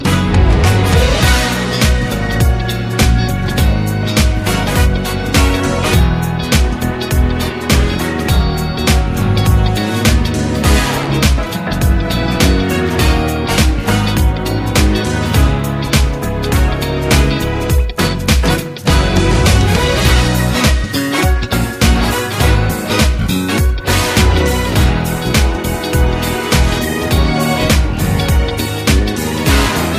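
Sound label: Campfire Instrumental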